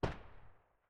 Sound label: ump45 silencer mono far